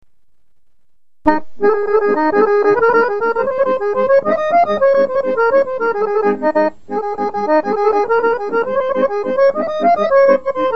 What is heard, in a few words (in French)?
Grancarsko Horo, Bulgarie